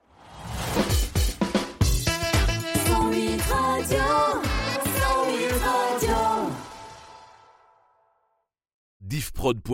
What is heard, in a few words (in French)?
Radio Jingle Chante Funky Sans parle